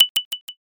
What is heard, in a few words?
hyoshigi